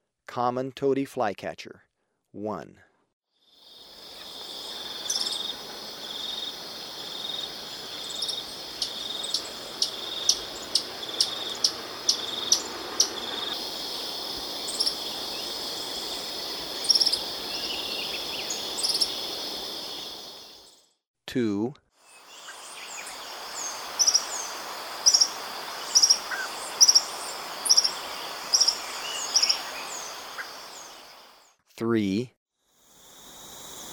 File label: Common Tody-Flycatcher, Birds of Ecuador; S. America